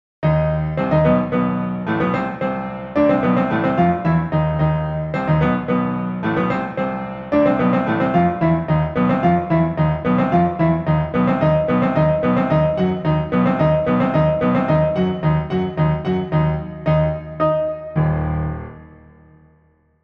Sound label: Piano Djingle; Liszt